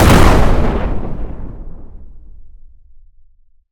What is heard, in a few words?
Explosion Large; JASEM: Just Another Shooter with Electronic Music